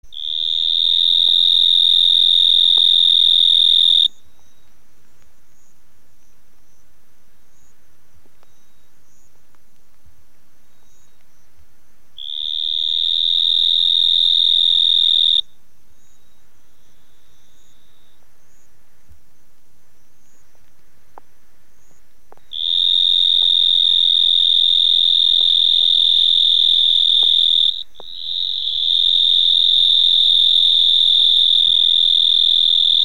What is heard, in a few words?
Hemisus marmoratus calling